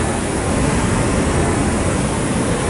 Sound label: welding Tool; Tools; Subsurface; Barotrauma